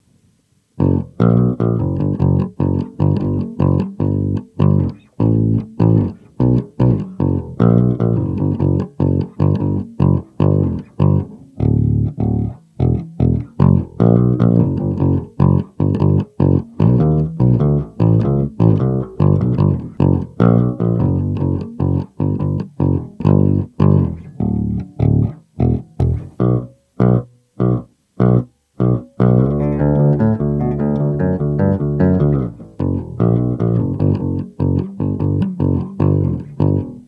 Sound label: bass; stealth xl